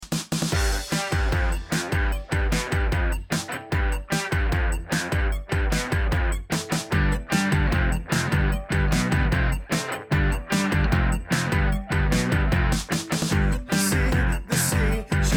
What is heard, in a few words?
ELECTROPOP